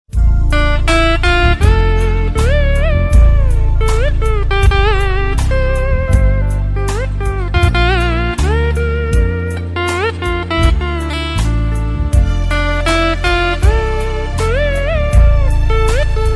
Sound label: Hindi Instrumental